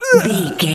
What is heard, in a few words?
voice male grunt pain death